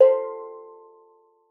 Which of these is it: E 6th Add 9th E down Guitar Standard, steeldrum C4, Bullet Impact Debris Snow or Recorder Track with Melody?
steeldrum C4